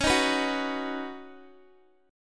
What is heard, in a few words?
Db 7th Suspended 2nd Suspended 4th, Guitar; x,16,15,16,18,x; Guitar-4F,4A,5C,5G,5C,6E; Db7sus2sus4 strummed